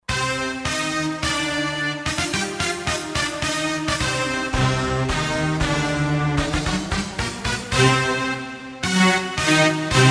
Orch Stab, Roland JD-990; Factory Patches

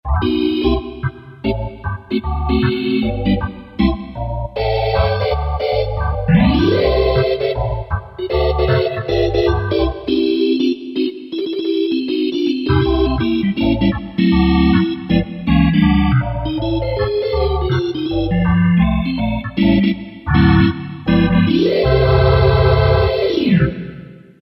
Cool! Rot, Yamaha YPT-400; Voices

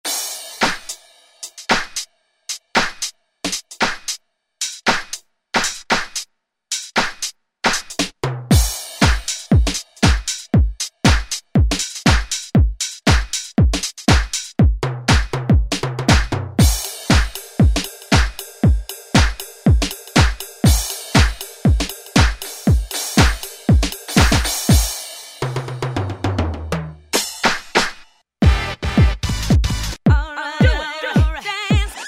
Hard House Set, House Collection; Roland SR-JV80-19 House Collection

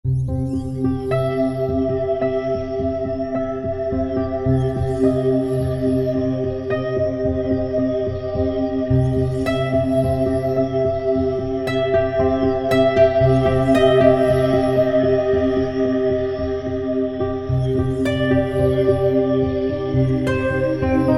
Roland SRX-07 Ultimate Keys; In Peace

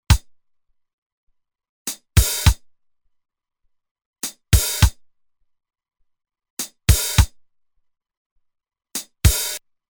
Break, Roland TR-505; Factory Rhythms

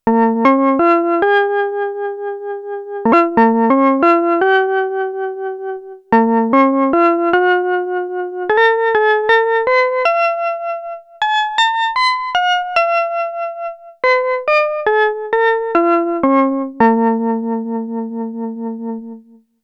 ELECTRIC PIANO II, Roland SH-101; Factory Patches

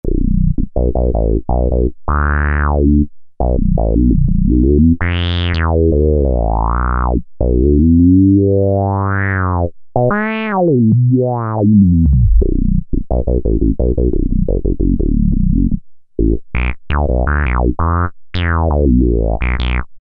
Boost Bass, Korg MS2000; Factory Patches

